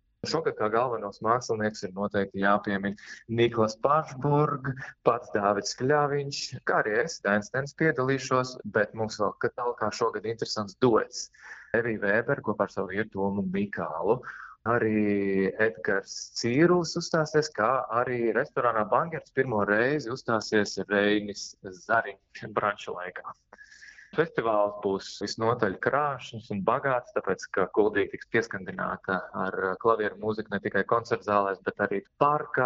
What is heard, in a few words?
Piano; Kuldiga